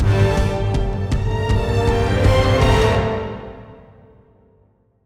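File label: Combat Transition 160 G minor 4/4; Combat Area A1 Trans3to4 160 Gm 44 Master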